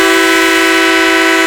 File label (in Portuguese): buzina